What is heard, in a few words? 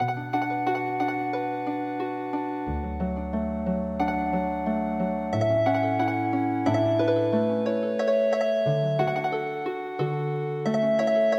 yodogawa 30sen hunauta Healing Harp